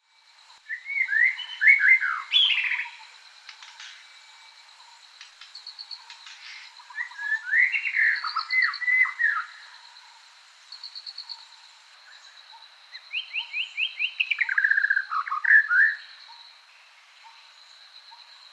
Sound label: Copsychus malabaricus leggei